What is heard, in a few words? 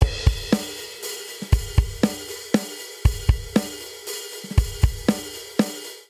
Straight Three Rock Intro 3/4 118 bpm, Drum; MIDI Clips; Ableton; Factory Packs; Drum Booth